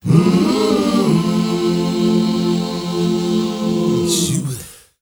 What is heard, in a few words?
HUMMR, MMM CHRDS; Spectrasonics; Heart of Africa 2